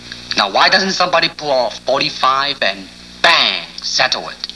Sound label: bang